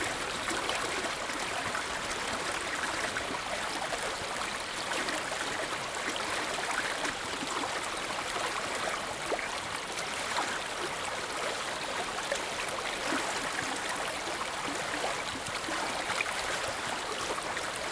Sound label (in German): Sanftes Bachrauschen Filsquelle; Aufnahmen von der Filsquelle